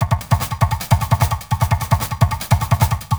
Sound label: Beat, Industrial